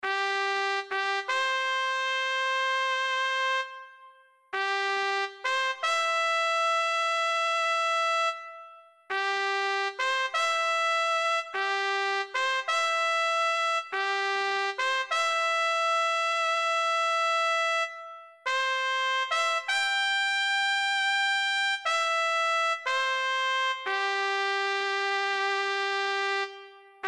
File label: taps, midi